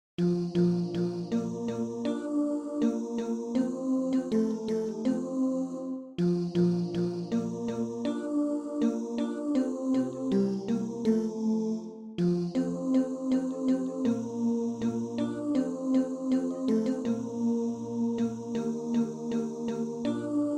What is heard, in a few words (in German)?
tenor